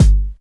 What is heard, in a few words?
good kick drum sample g key